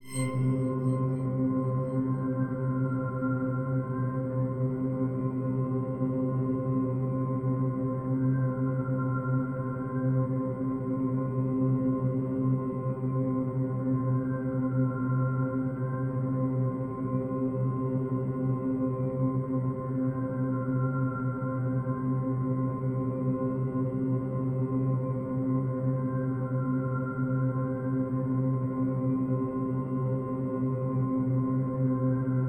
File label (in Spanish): Loops mutantes